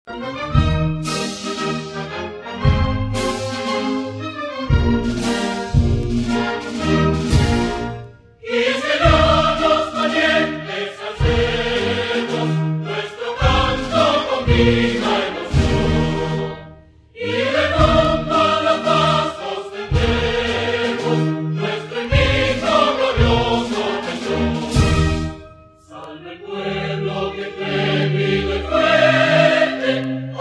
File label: himno rd; Kimera; karisma; republicadominicana